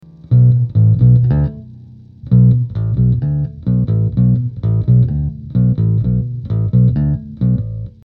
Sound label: elixer bass